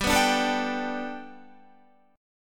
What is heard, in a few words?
G Major Add 9th, Gadd9 chord, Guitar, 4F,4A,5C,5G,5C,6E; 14,14,14,14,14,15; Gadd9 strummed